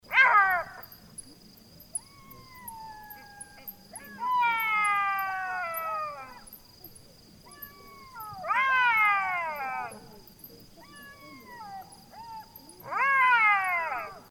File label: black backed jackal